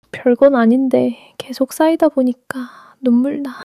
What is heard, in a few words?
crying